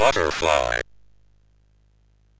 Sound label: sid MOS6581R2